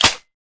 transformgun clip out; weapons

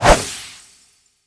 shoot; transformgunadv

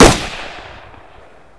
p90; cstrike; ZP CRISTIAN; ZP WEAPONS

